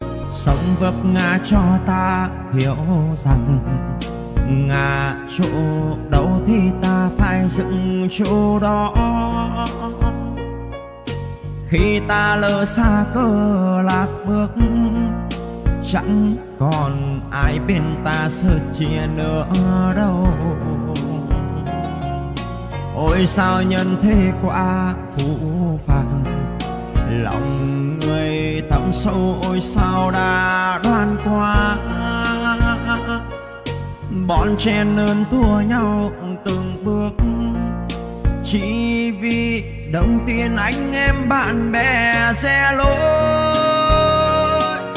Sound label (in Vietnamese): Bai hat